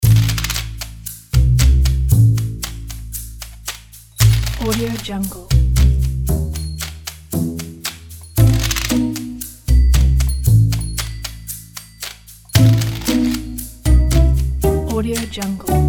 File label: sneaky sneaky